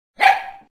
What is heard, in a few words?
woof; Kyocera KX5 Slider Sonic Virgin Mobile